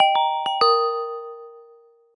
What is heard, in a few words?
Success, notifications; Lenovo; ZUI 13